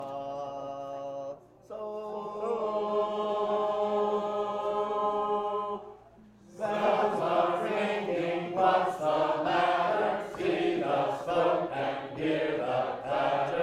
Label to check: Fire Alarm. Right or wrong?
Right